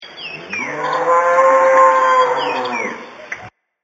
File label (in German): muuuhhhh